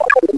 Expire; PPPremier Timer 2

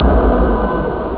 horn

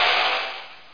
spin; HEXEN2; assassin

